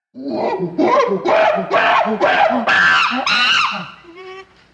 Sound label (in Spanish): mono enojado